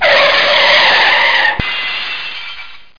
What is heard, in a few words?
boom; chase